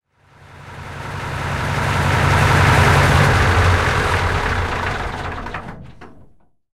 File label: su 100 engine int stop, tanks; War Thunder